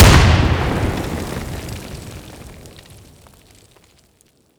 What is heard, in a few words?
grenade Detonate; Weapon Package FortWars